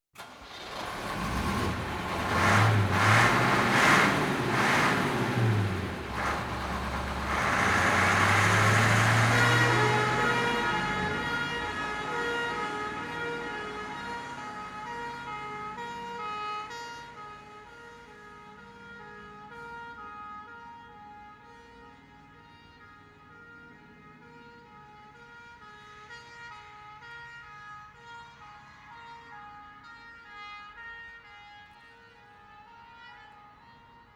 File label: Fire Engine, Started, Revs, Depar; Emergency; BBC Sound Effects Library